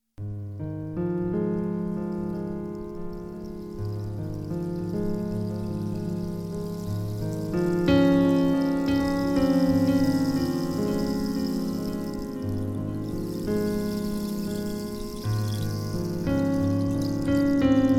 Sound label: Pentatonic Waves